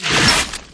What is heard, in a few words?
frost cryo pistol fire